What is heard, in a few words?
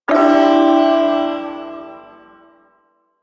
Shawzin Maj Chord; E; Warframe; Duviri Ersatz Shawzin